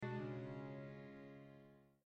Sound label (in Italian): basso; dido eneas purcell